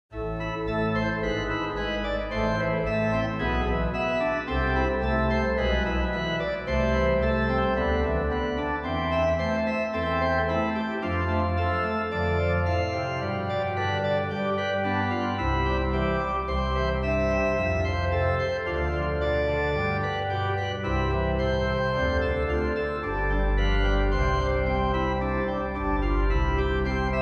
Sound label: Organteq Am Invention